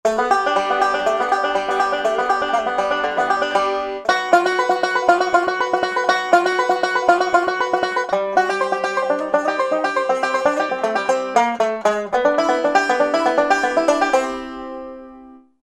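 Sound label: Banjo Phrase; Country; Roland SR-JV80-17 Country Collection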